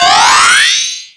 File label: magic rise